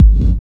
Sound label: hard bass drumz